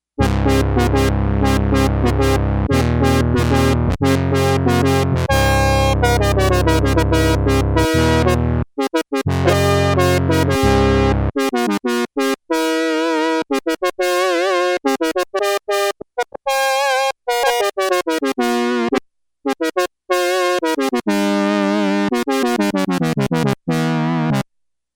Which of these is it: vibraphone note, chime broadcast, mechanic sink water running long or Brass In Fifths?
Brass In Fifths